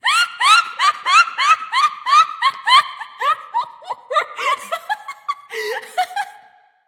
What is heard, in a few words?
laugh; Smite; caster